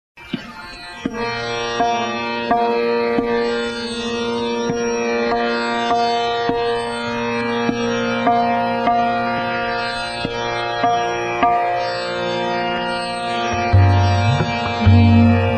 Hindi Real Music Ringtone; ringtones

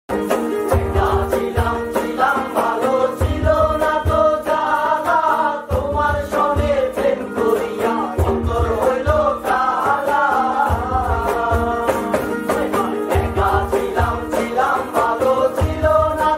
Bengali Ringtone

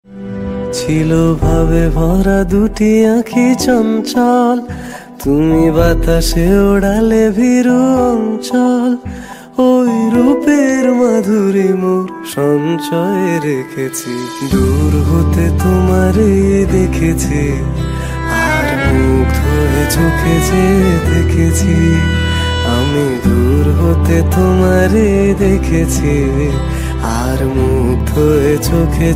Bengali Sad Ringtone

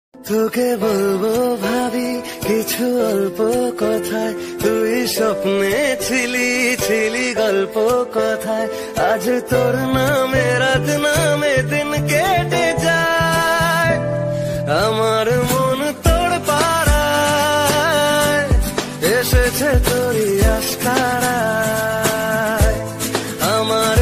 Bengali Ringtone

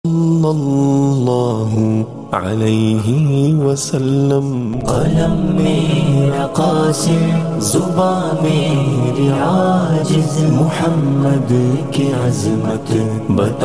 Naat Ringtone

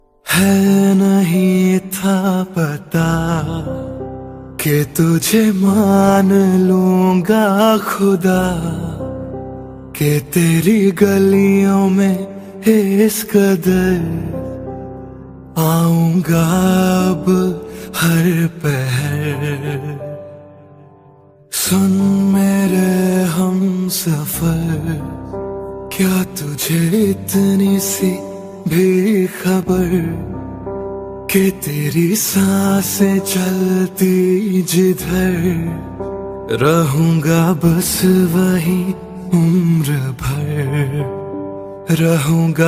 Romantic Hindi Song Ringtone

